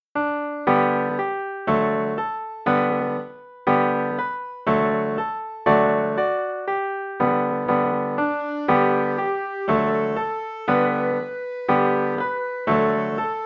in G (recorder); Juguemos en el Bosque

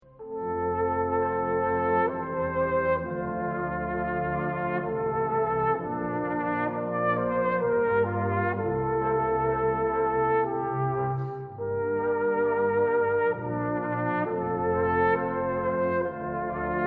flugelhorn

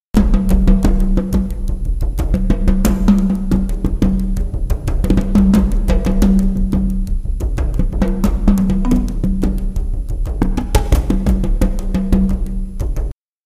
tom toms; percussion

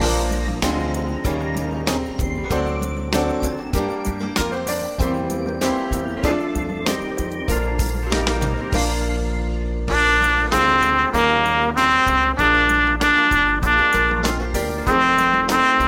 Mary Had a Little Lamb (band) - melody; Practice music; Hot Cross Buns

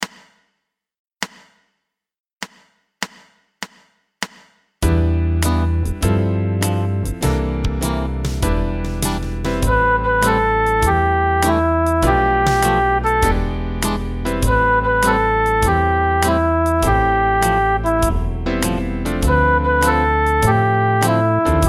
Recorder Blues

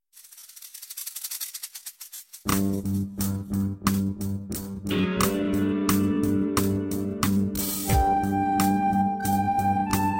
Snakes in a Bag melody

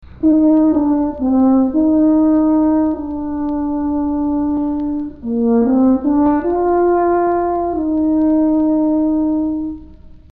french horn

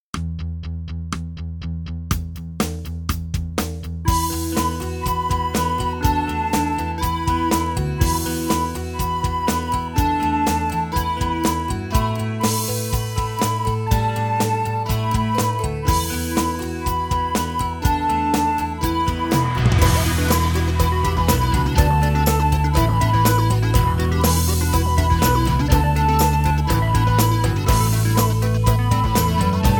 Bouncing Ball (melody)

